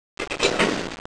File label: switch